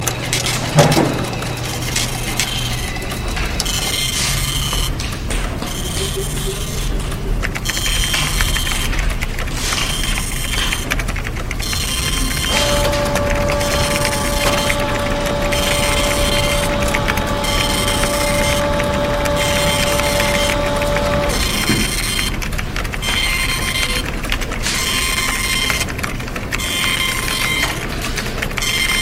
Office Ambiance

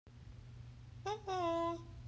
woohoo; Harry Potter

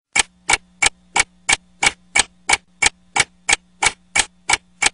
Tick Tock

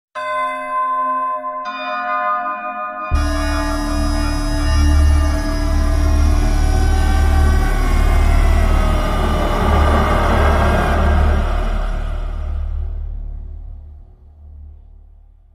Round Start

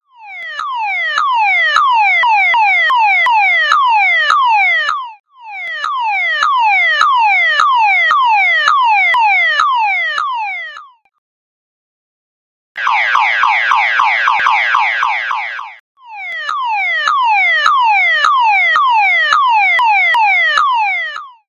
Wake Up Clock Sound

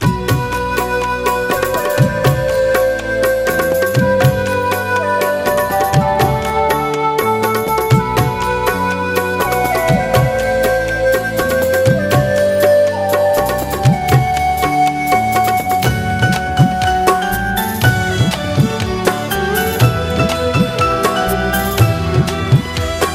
Music

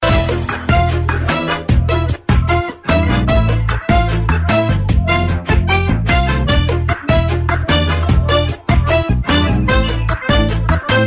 Tango

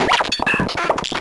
Wrench

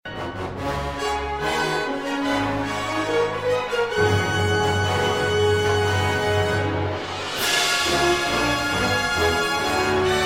Fanfare

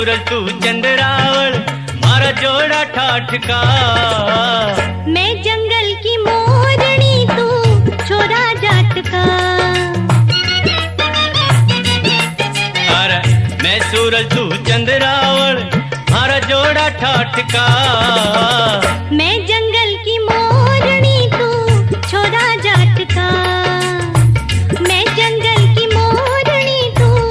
Haryanvi

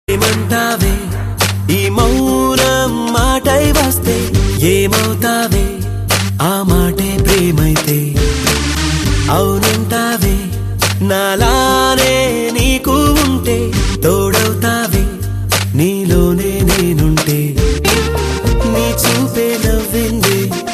Kurradu Music

